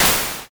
splash

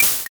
syringe

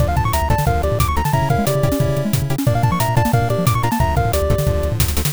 bgm

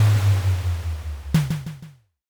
castle gate

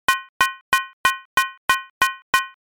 stir

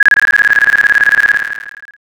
trainbell

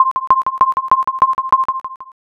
railroad

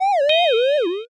stun

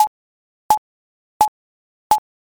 thinking time

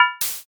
basket

